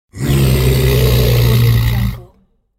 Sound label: large creature growl